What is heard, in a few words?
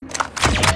draw; valorant; 2024champ